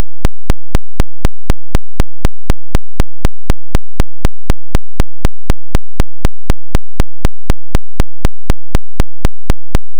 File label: square 2Hz; Testsignal study